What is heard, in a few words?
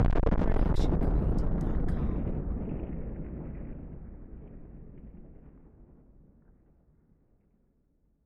Nuclear Explosion Distorted; SoundsCrate